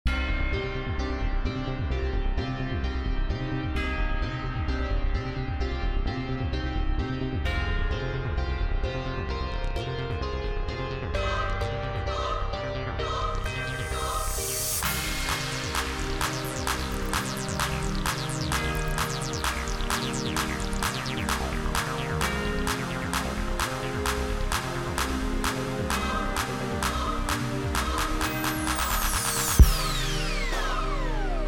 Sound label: Instrumental